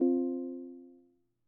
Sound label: noti